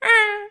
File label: cute, creature